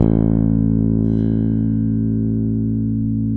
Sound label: SPEC SUSTAIN, Bass